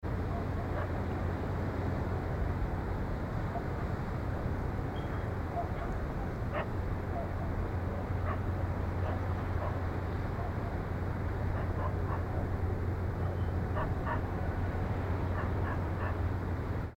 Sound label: dogs